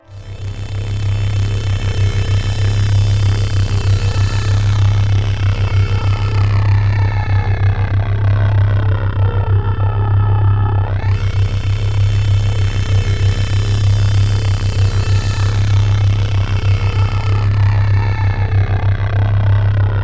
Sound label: Odd Looper with FX; AMG - Prototype Drum & Bass